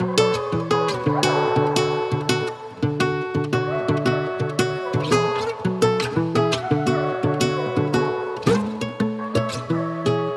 170 BPM F# Min, Guitar Loops; Fiction; Cymatics; Journey Guitar Loops

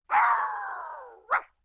LoFi Synthetic Dog Squawk; Vintage Cartoon; Comedy Vocals

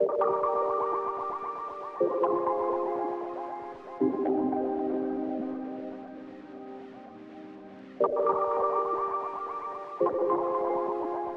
120 BPM G Min Pad; Melodies; Loop Stems; Cymatics; 2022 Melody Mystery Expansion; Unfamiliar

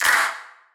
Main Clap; Claps; Future Bass; Drums; One Shots

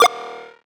Retro FX; Cymatics; Video Game Sample Pack Vol 1

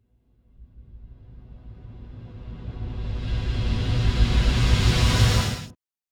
Riser

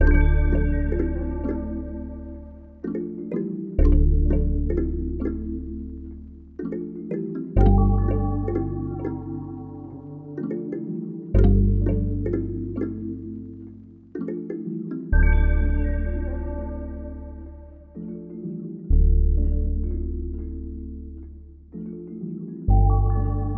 Subbed 127 BPM D Min; Cymatics; 2022 Melody Collection

